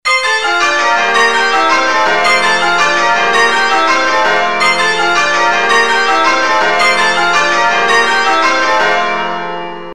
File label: Queens, changeringing